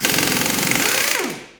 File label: car change wheel, street traffic; best service Blue Box